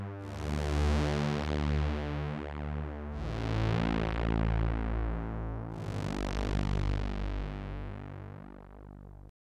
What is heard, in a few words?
pad; opxpro2; Riddle